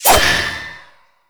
Void Dagger Whoosh, melee weapons; Warframe; Zariman Dagger; Void Mode

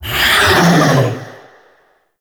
Vocal Attack; Wraith Reaper

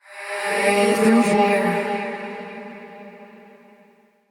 Hand Compass Vocal; Warframe; Duviri

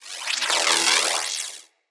Voltaic Sword Projectile Whizz By; Characters; Enemy; Abilities; Sinecure; Banneret

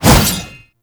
Shield And Sword Whoosh

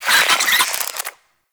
Infested Ashen Vocal Attack Death; Warframe

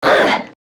Pain Grineer Manic; Battle Barks

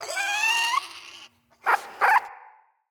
Sit Spawn Beaver Yawn; Dendria

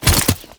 Brood Cast Stop; Warframe; Oraxia

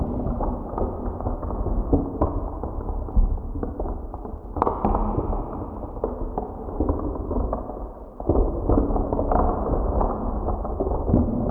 Organic Crackle Loop; Shadowgrapher; Warframe

